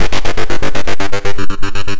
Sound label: philter (seq); Sonic Foundry (Sony Creative Software); Ugly Remnants VOL-2